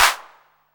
808 CLAP, TR-808

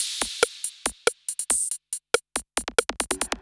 electro beats 140

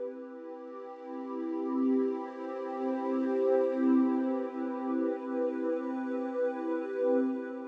SYNTH PAD ETHNO 125 A, Sphere

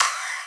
perc; Anthemic.Electro; drum hits

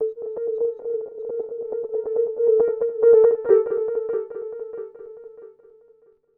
TEKNO 140; Techno

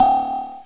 PLING 8; KillerTweaksII; DirectWave

